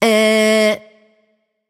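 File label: tongue, non-verbal; smite; voicepack; tiamat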